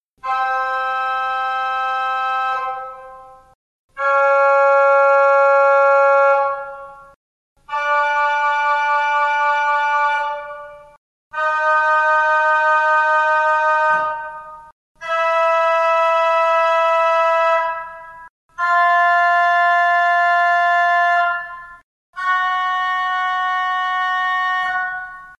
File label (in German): Oberwerk Cornett, orgel